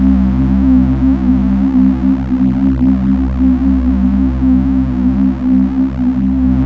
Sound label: MAT SOFT DRONE, OBI DRONES; Zero-G Ambient 1